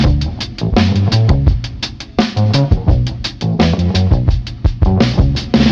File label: full of bass and booze, Loops Phonky